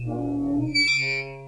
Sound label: door; Mafie 1